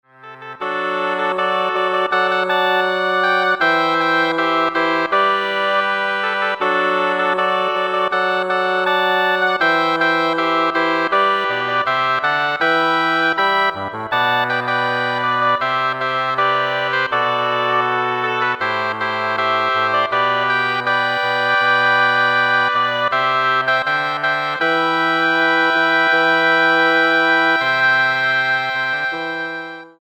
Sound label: Double Reed Ensemble